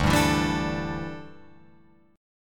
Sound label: D Suspended 2nd Suspended 4th, Guitar, Open F, chord; strummed